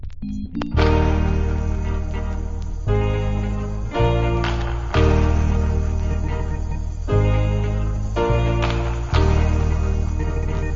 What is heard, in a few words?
Reggae Refix